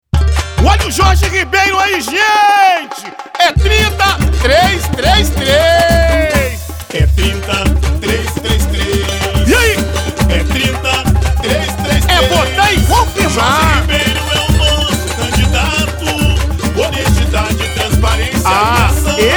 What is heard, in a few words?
jingle